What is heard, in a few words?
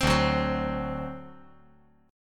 D 7th, down